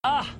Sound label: notification